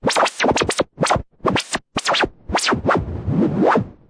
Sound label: noise Modular, Noise Sweeps